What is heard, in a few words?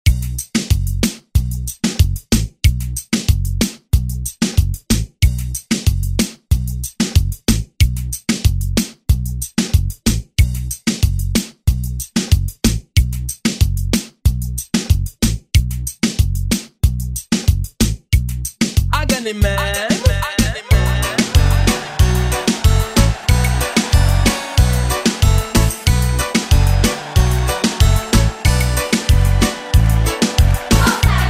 Reggae Redrum snippets; Deejay Hit